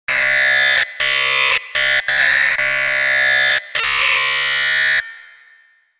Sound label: Weird Cyber Dot Matrix Printer